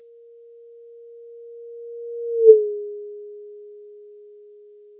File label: Doppler Sound Effect